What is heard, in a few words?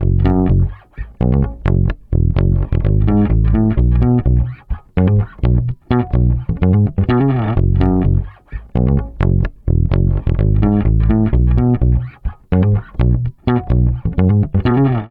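F# DISCO BASS, 127 BPM; Drumdrops; Compact Disco; BONUS BASS LPS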